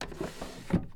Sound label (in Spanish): CERRAR CAJON; Yesterday Origins